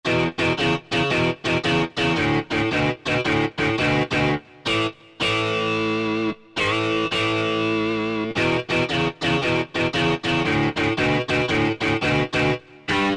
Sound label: Rocklead, Korg MSC-6S Fretted Insts; Fretted Insts 1